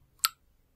drip, Water; Foley; Kenney's Sound Pack

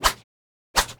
Whoosh Swing Fishing Pole Full; Soundopolis; Gore Toolkit HD; Fienup

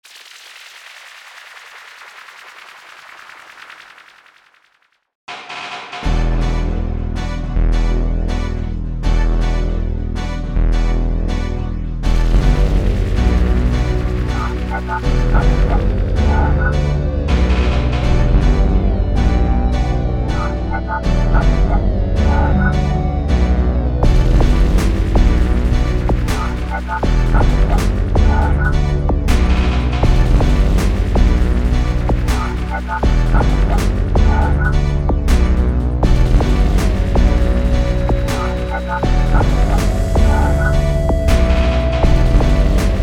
Footsteps

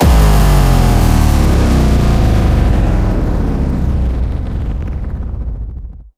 Downer, Trailer Downers; Federico Soler Fernandez

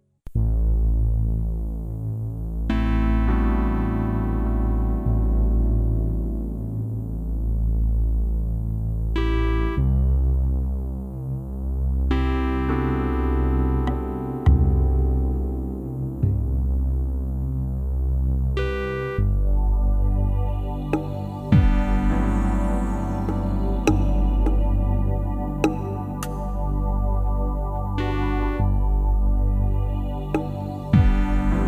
Ambient H Spaced Out

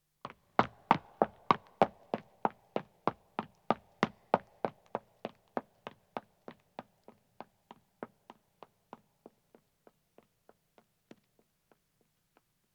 Stone Running, 1 Man Departs, Footsteps; BBC Sound Effects Library; Footsteps II

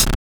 Percussive Elements

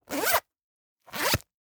Zipper Small Bag; SoundBits

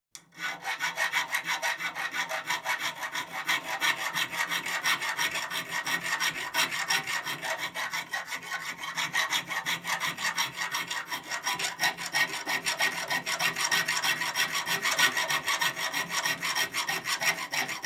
File, Metal; Construction; BBC Sound Effects Library

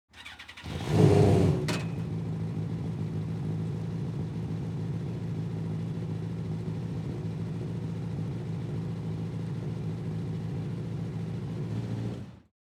Sprint Car, Start, Rev, Idle, Off, Mid RPM; Sprint Car Racing

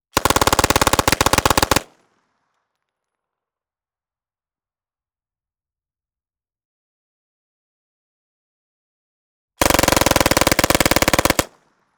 PPSh41, Firing, Burst, Long, submachine gun; MKH416; Tokarev PPSh-41

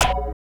Tech, Weird Side Samples

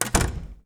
door close; 3maze; Doors, Locks and Drawers

